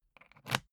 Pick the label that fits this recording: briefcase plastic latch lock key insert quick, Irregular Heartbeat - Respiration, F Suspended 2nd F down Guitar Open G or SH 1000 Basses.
briefcase plastic latch lock key insert quick